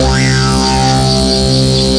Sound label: Synthesizer Middle C Notes, Lead